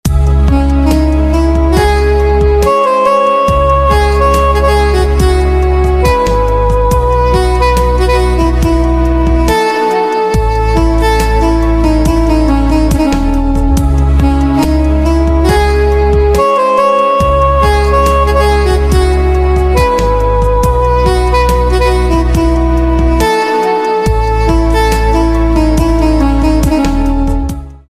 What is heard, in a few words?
Saxophone